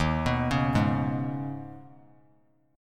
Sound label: D sharp 7th Suspended 4th Sharp 5th D sharp; arpeggiated; 15,18,16,18; 3C,3F,3A,3D; D#7sus4#5; JGuitar